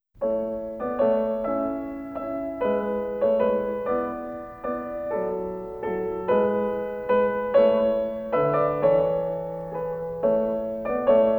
Piano Sonata Alla Turca; Mozart; Piano Sonata No11 K331

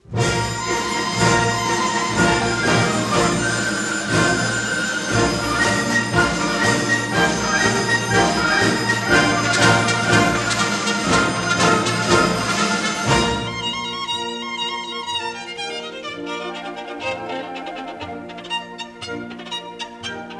Capriccio Espagnol Op34; Rimsky-Korsakov

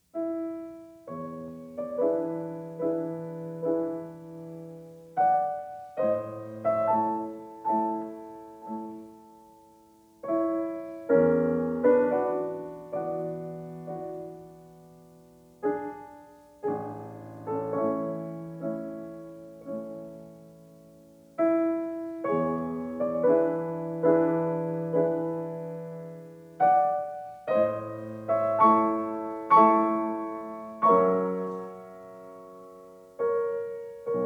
Preludes; Chopin

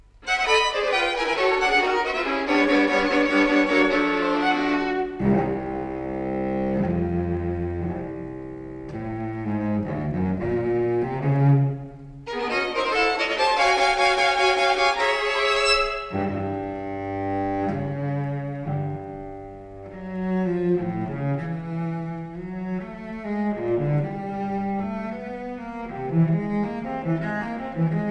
String Quartet; Bartok; Quatuor Vegh

